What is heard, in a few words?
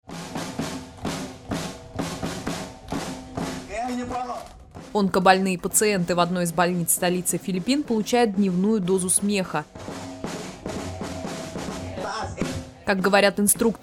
LAUGHTER YOG